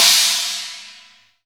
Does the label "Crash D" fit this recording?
Yes